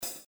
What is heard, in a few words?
Hi hat Sound